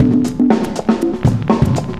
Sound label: cooling 120; loops